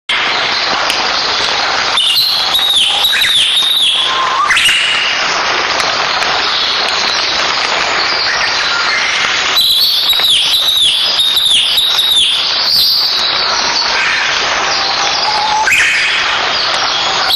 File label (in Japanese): キビタキ 戸隠